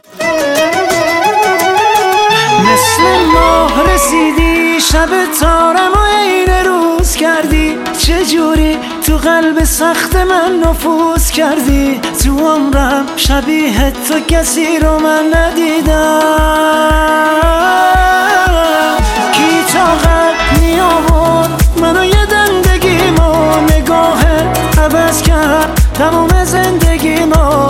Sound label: Ringtone